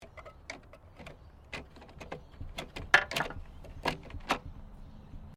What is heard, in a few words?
LAWN MOWER TINKER, Old lawn mower; Victor; Lawn Mowers; Machines